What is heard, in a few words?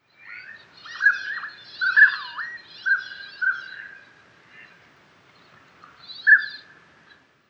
Northern Pintail Anas acuta